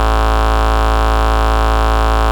Bass Root A

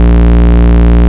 Root A#, Ultimate Bass; Vengeance Samples

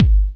Soft Kick; Vengeance Samples

